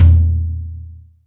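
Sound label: Lite Floor, Acoustic Tom, Yamaha DTXpress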